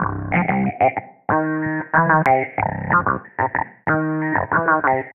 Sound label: Slap Wah, Bass; Sonic Foundry (Sony Creative Software); Synchro Funk